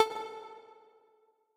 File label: Tessellation Move C; Mixolumia